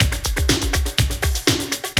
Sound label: Loop, Roland; Sound & Vision - Gigapack I